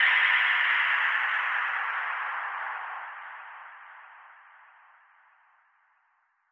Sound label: FX Down, Club Techno, 140 BPM; Zero-G; Construction Kits